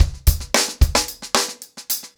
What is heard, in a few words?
Hip Hop 110BPM, acoustic drums drumloops